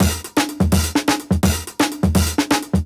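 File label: Jungle Breaks, 168 bpm; Original Jungle Breaks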